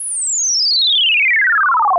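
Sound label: FX synth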